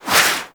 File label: Brawler Rock Punch Swing; Warframe; Warframes